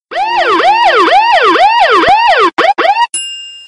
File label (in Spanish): sirena de policia; whatsapp